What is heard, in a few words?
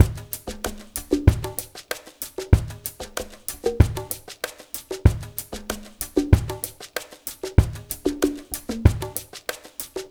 ABO MIX, Liquid Grooves; Spectrasonics